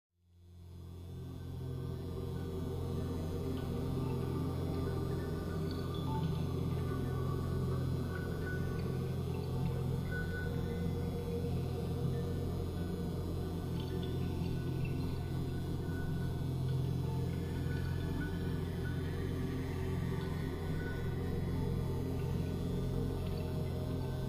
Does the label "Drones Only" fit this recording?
Yes